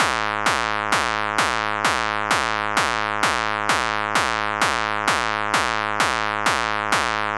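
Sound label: hardcore 130 BPM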